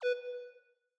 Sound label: Ding; Longhorn XP Sound Scheme by David Mounder; Reincarnation of Windows XP Sounds in fake Longhorn (Samsung Theme for WinXP) style by David Mounder